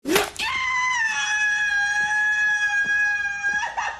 scream; ryan gosling

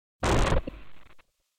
teleport

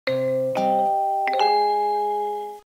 tgv jingle

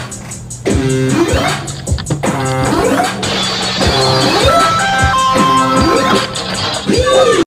goofy beat

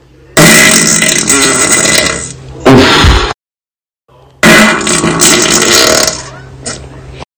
fart

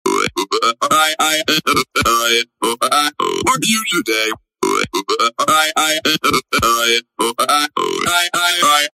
hello vocals

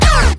pppp unison

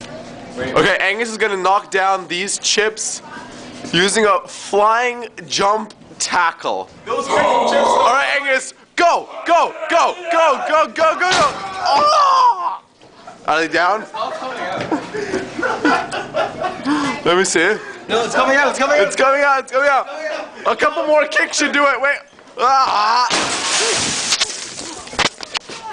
kid breaks vending machine

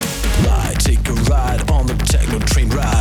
train

